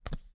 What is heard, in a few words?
Walk; portal quest android